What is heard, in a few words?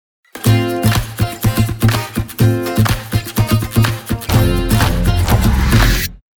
just groove